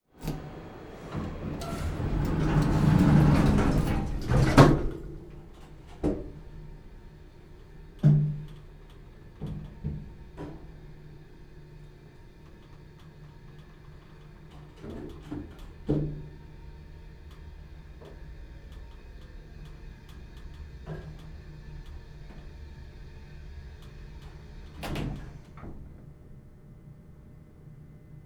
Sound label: Emergency Elevator Down One Floor Interior Maximum Security; emergency effects; AdobeCC